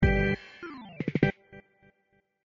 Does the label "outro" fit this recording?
Yes